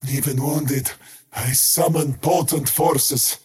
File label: skywrath crown cast pain; skywrath mage